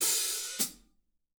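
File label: hat open close, drum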